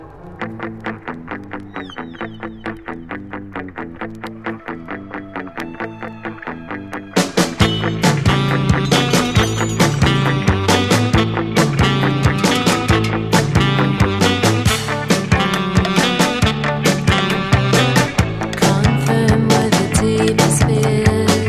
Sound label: drum beat for baby